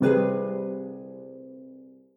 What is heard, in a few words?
Harp; Joan Miro - Blue II